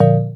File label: error